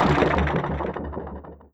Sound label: vulcan wind